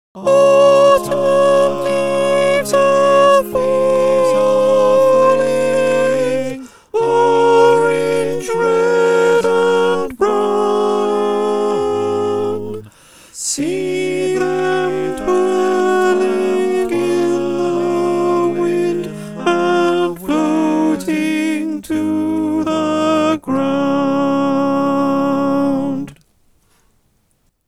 sop, choir

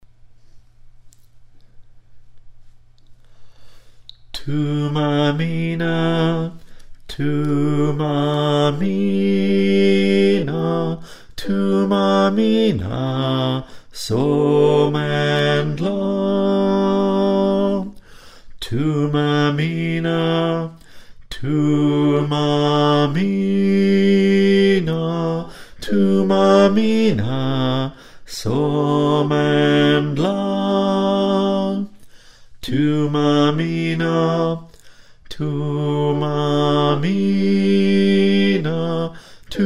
Bass

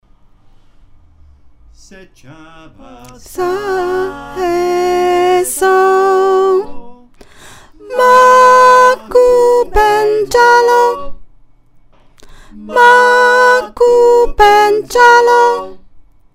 Soprano, choir

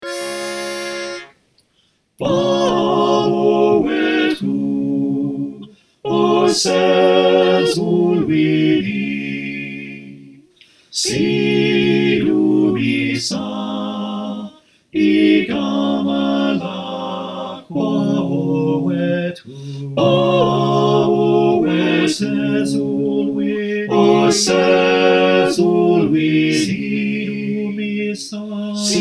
SATB with Call

